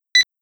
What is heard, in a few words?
detect, metal detector